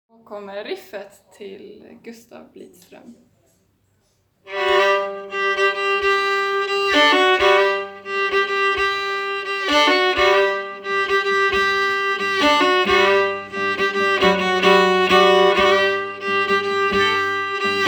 Menuett, riff; Menuett efter Gustaf Blidstroem

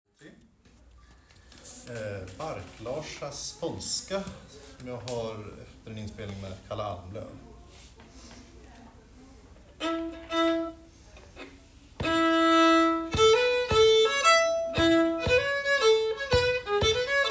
polska; Bark-Larsars polska

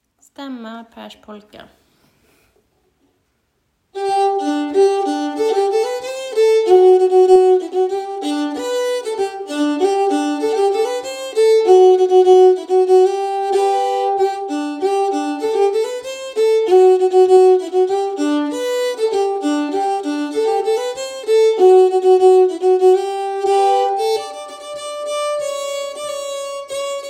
polka, Skane, 2a-stamma; Pers polka

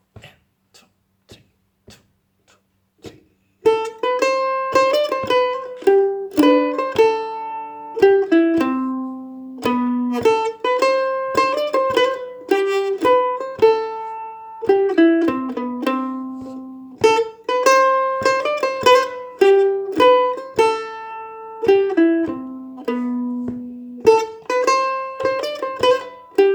Polska fran Jamtland, 2a-stamma

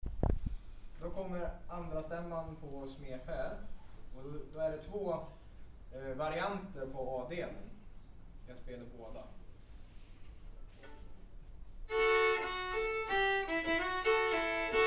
Polska, Jarvso, 2a-stamma; Polska efter Smed-Pelle, Järvsö

